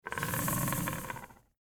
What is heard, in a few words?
Spawn Vocal; Gut Eater